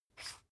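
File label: toothpaste close twist, Bathroom; haus-garten; badezimmer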